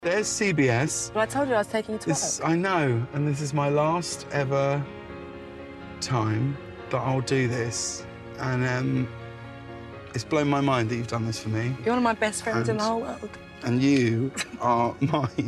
carpool karaoke; JAMES CORDEN; ADELE

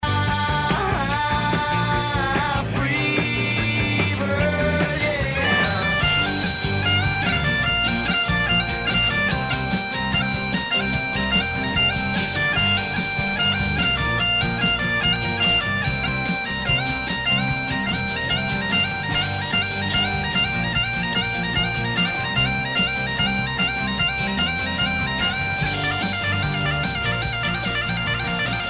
bagpipes; ALLY THE PIPER

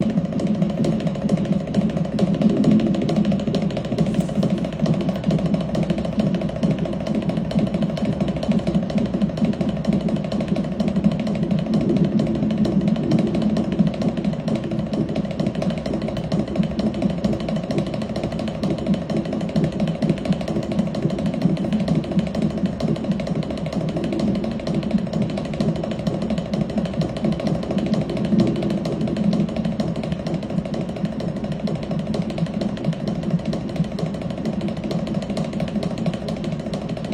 drums in the hospital

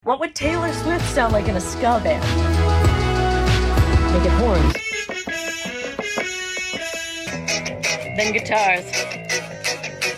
ska band

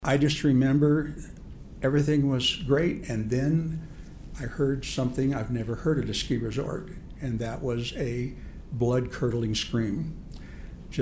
scream; GWYNETH PALTROW

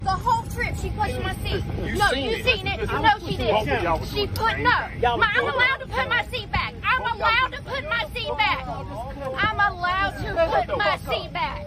seatback shuffle

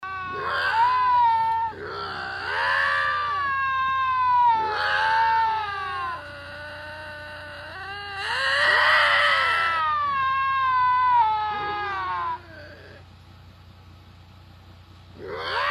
scream therapy

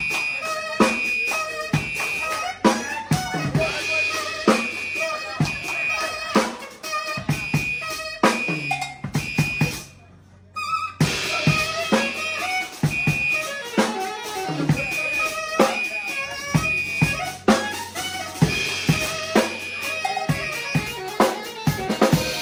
alarming beats

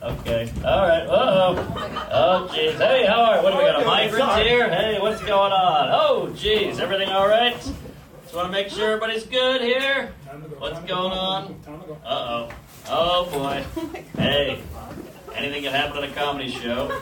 COMIC TASER STUNT; MARK NORMAND

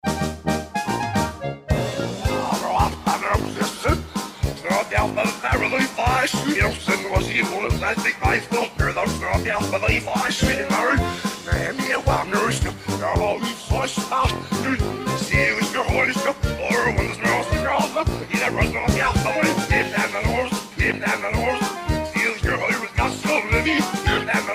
polka

